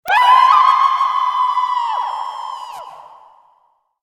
scream female

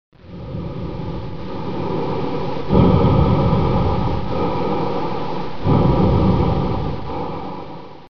breather

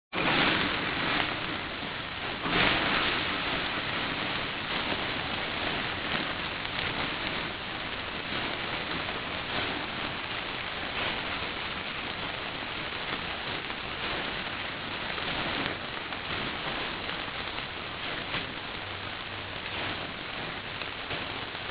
BBQ Grill fire

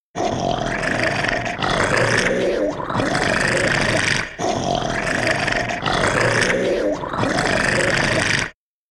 Monster Growling

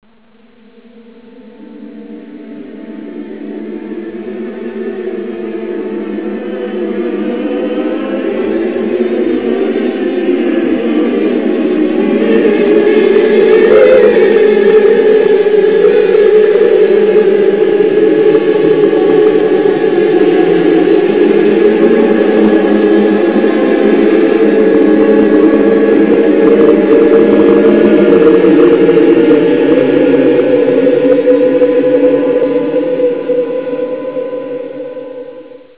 Eerie Choir

